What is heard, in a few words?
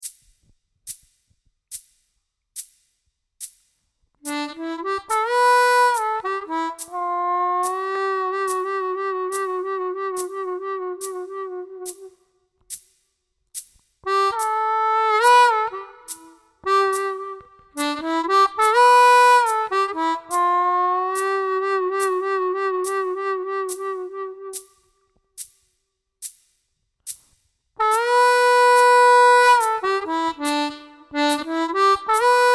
slow riff harmonica C